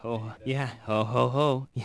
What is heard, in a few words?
ohohoh; Wired for Sound Pro CD; Aristosoft, Inc.